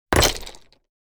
Rocks and various debris thrown on bricks and asphalt; gfxsounds